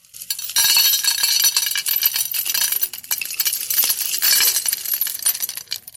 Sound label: Shards